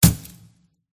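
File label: undead attack contact up; umertvie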